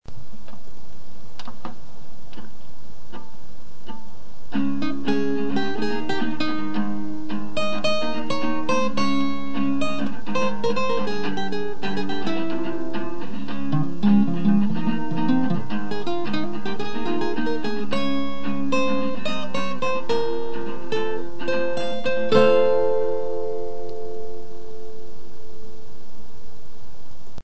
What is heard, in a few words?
acoustic solo